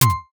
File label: cursor tick